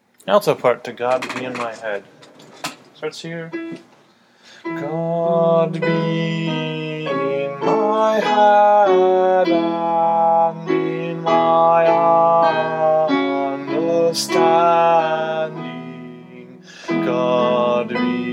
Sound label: god be in my head alto